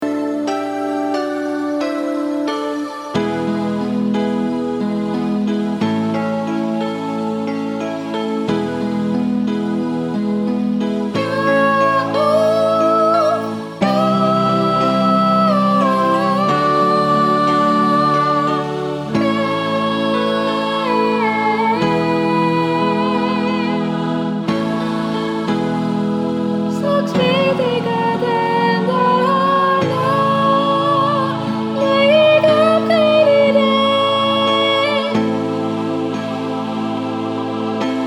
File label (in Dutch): oefenband sopraan groep